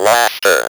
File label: LAUGHTER, Speak & Spell